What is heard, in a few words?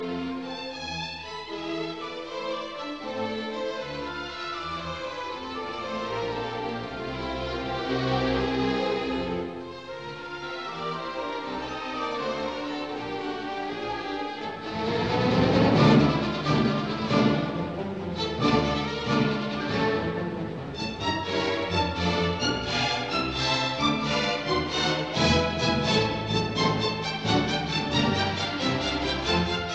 Symphony in D minor Scherzo Lebhaft Trio; Schumann; Op 120; revised version 1851